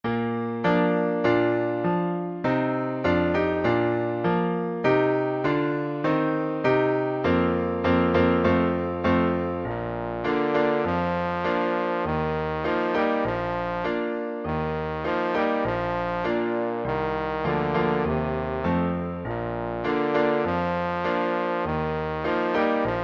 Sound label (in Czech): trombon klavir; Přednesová skladba pro trombón; Průvod cti - trombón; t johnson